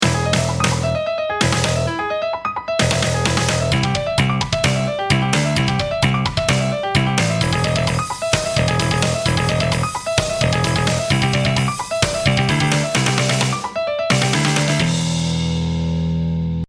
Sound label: String Skipping Conundrums Backing Track